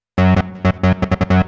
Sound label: legend, 160bpm, bass, hous-tec